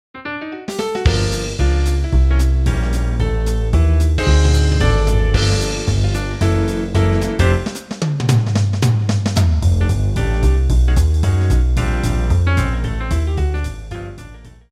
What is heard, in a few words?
Piano Trio